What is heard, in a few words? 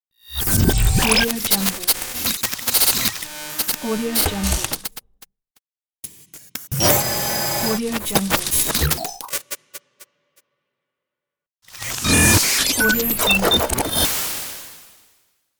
digital distortion glitch